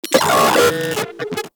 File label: Vocal Pain; Moa; Vocal Set D